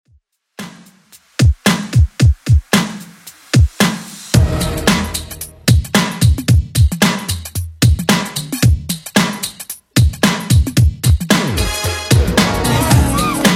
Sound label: 90s Redrum 112bpm